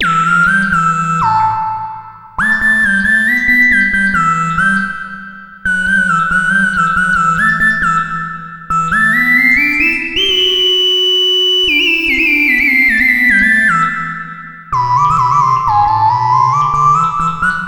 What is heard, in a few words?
BEGIN SOLO, Synth and Organ; InVision Interactive; Keith Emerson; The Most Dangerous Synth and Organ